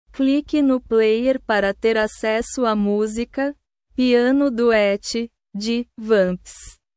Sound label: piano duet